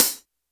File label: HiHat, Roland R-70